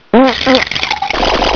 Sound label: diarrhea